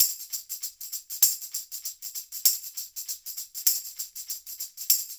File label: LOOP, SHAKERS, Percussion